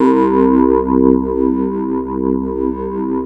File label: PPG C2, OLD PPG; Sound Gallery - Charged